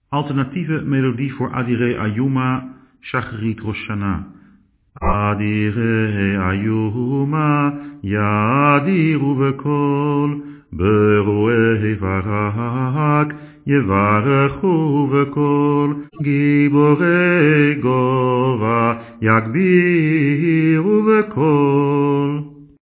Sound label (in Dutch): Adire ajoema alternat standaard melodie; Tefilla: Ochtend; אדירי איומה